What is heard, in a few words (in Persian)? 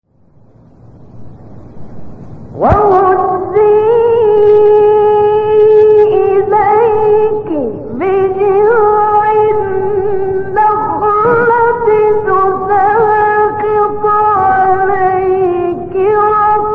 تلاوت زیبای آیه سوره; مریم; استاد غلوش